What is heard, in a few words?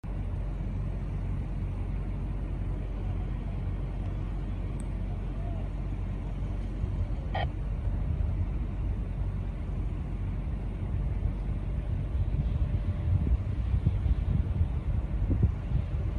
Antonov-An-124, آنتونوف 124